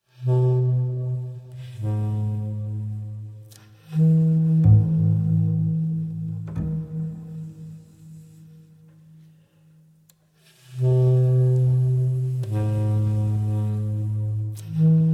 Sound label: A Flat Minor